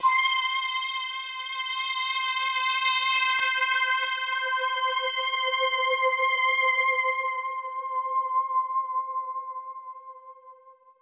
NL A4 C5, STRINGS; Music Store Sound Service; Einstein

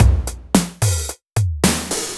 Beat, Drums, Eclectic Electronica

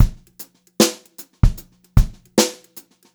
beat, Brushed Drum Loops; USB Soundscan vol.39; AKAI; 90 s Sample CDs

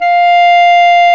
Clarinets, Orchestral Winds; Roland LCDP04; 90 s Sample CDs; Clar Cls

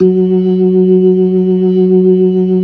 LO MAN V R, ORGAN LOWER MANUAL

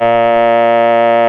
REED A#; Big Fish Audio; Synclav FM

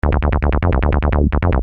Syn-Bass m D#, Techno Synth Loops, 150bpm; Sonic Foundry (Sony Creative Software); Signals I

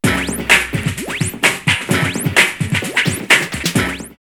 drumloops Rave 129; X-Static Goldmine; 90 s Sample CDs

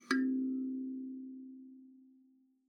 KALIMBA C4; Xsample VOL-7

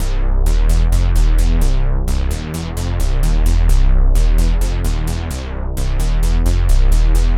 Noise Bass; Dr. Fink's Funk Factory

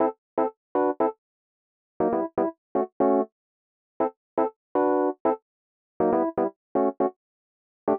120 Rhodes G, Keys, Nu Jazz House; PowerFX

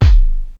Kick, Ensoniq ASR-X Pro

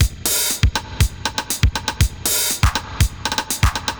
Spring Verb Compressed TR 505 120BPM; musicradar; cyborg beats

